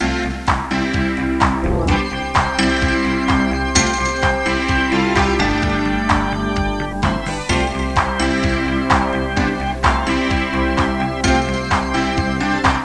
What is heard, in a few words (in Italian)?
suonerie musica rock